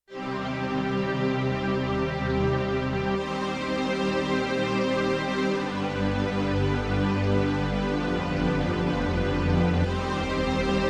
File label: Synth String Pad II; Yamaha TX16W